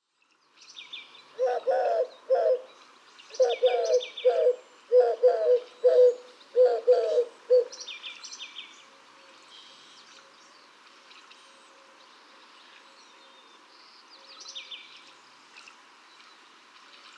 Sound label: Eurasian Collared-dove